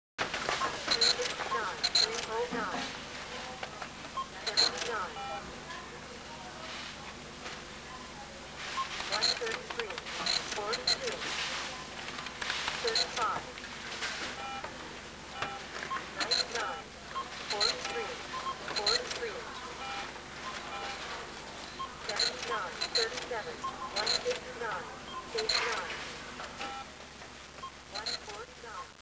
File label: cash; ambient; shopping